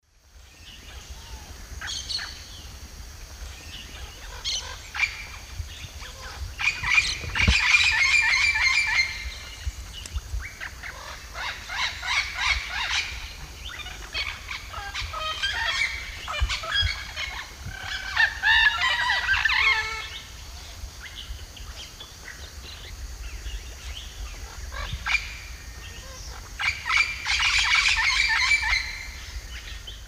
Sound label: Amazona amazonica, calls; S. America; Eastern Lowlands